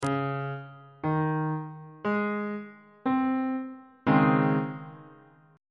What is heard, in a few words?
d flat major 7th suspended 2nd chord on bass clef